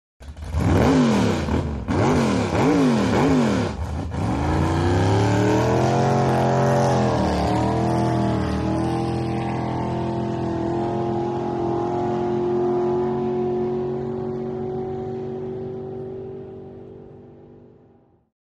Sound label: Drag Boat Start revs